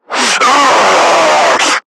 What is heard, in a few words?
Screamer Pain; Warframe; Lasrian